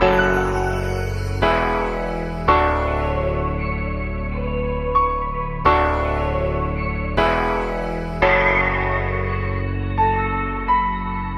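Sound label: piano keys voice, loops, phonky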